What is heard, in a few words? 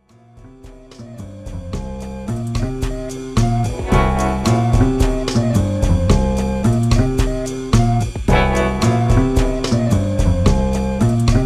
blues